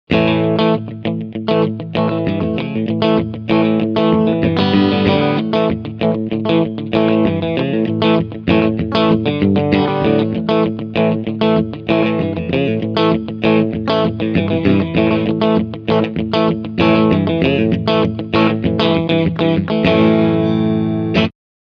Clean Riff Middle Rear Pick

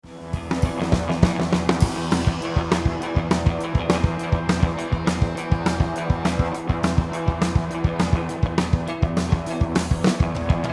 Krautrock DRY

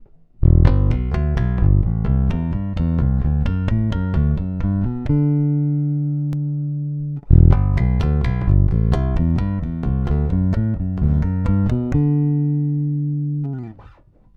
Neck passive active

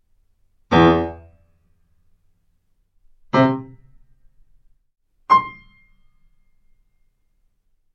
Piano Release

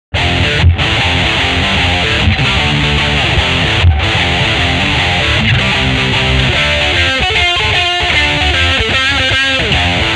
Vypyr 100 K Stein

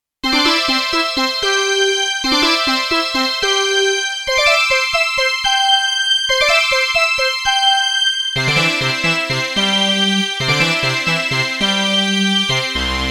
STREICHFETT

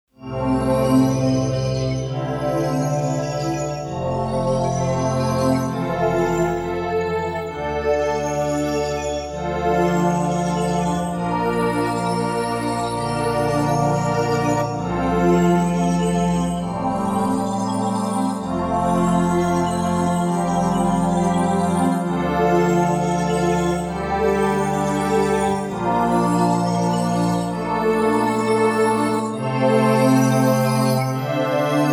Sparkles; Proteus 1

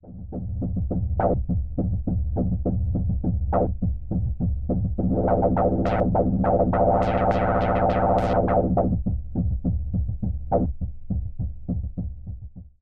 Waldorf 2 Pole Sine Pad Gate Trigger Runner

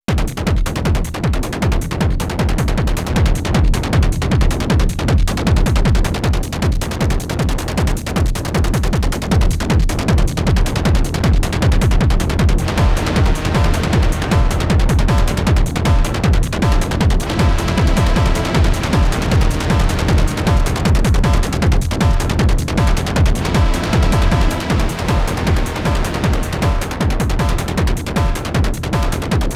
analog heat techno industrial dawless; jeanne mastering

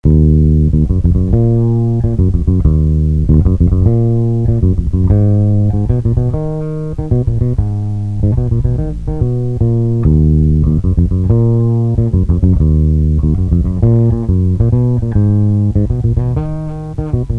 Jazzy

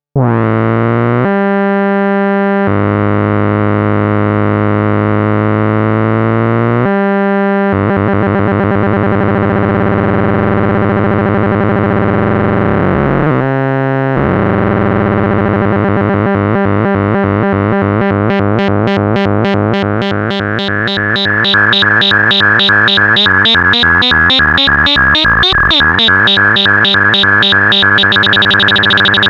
Square Wave LFO Square Out

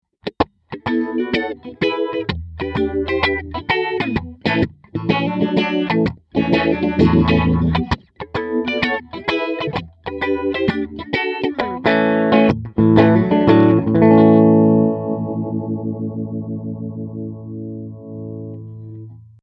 Uni Vibe

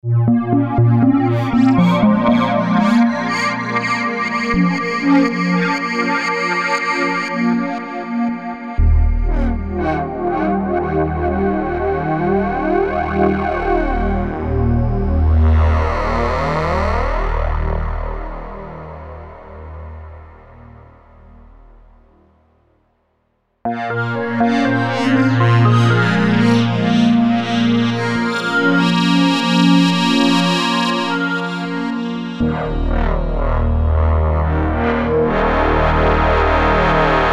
SMOOTH WAVEBLENDS; MODAL

